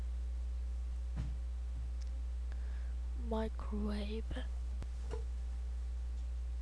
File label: microwave